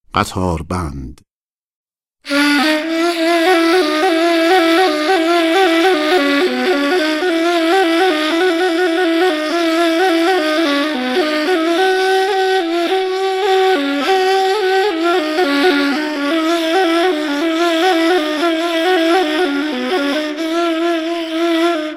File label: qatar band